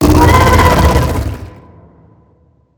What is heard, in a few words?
worm roar alt, creatures; Factorio